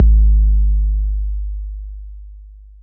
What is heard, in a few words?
BD 808 Deep